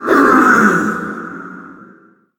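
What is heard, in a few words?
attack vox long; gundyr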